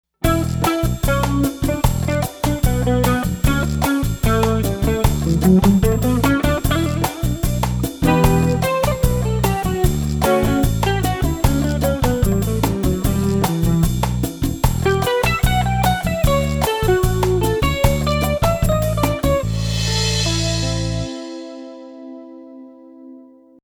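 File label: bossa nova; dr 880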